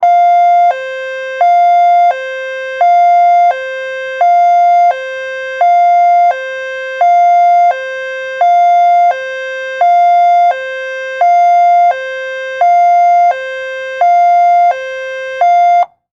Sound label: Emergency Siren Police High Low From Front; emergency effects; AdobeCC